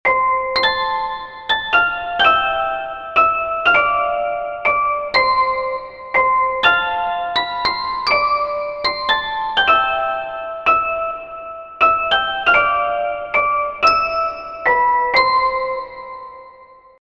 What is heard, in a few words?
glockenspiel